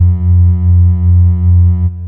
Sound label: sine ting